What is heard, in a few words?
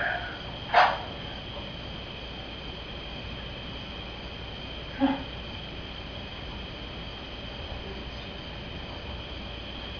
Unexplained whimper